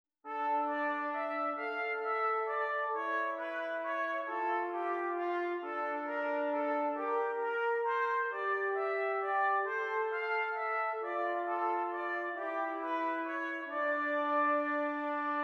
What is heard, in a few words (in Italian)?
Esercizi di contrappunto a due voci; Zwete Gattung; Ronge pagina 65